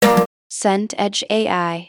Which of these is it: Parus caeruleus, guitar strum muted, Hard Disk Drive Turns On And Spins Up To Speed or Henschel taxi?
guitar strum muted